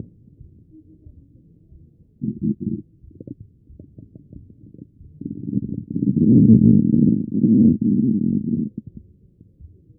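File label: anterior chest, position 4; B MODE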